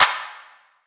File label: clap